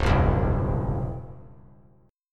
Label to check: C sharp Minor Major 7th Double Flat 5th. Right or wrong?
Right